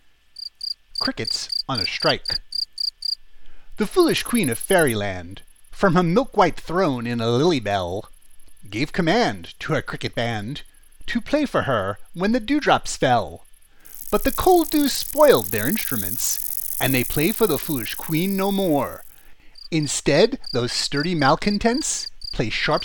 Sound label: crickets on a strike with sounds